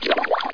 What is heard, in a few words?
POUR